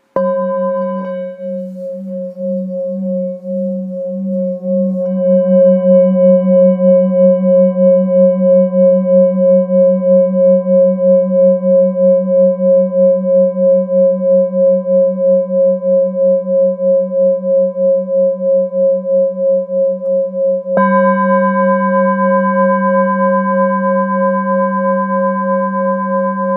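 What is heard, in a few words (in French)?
Bol bengale fa dièse; 900g-1kg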